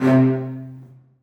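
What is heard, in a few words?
celli staccato c2; Sonatina Symphonic Orchestra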